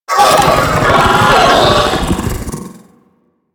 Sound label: Sentient Vocal Attack, Enemies; Warframe; DE; Sentients; Ortholyst